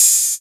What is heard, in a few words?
Cymbals HH Open; Vengeance Samples